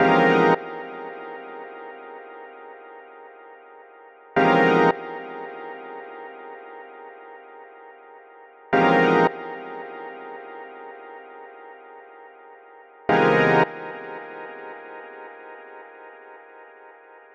Loop A# 110 bpm; 99Sounds; Mangling Audio; Mangled Software; Midiception

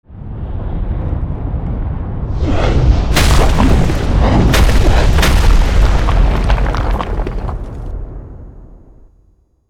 Rocks Falling from the Sky; Olivier Girardot; Natural Disasters

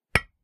stones Hit; Kenney's Sound Pack; Foley Sounds; Rocks

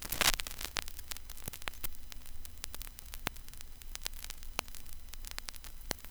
vinyl crackle; 99Sounds; Vinyl Noise SFX

